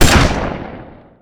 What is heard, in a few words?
Gun Nail Rifle Shot; Weapons